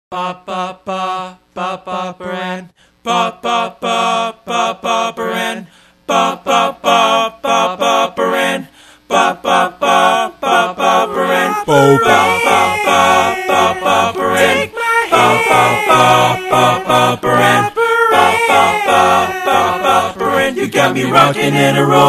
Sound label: vocals only; vocal version